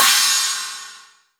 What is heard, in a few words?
CHINA, ROCK; ROCK #1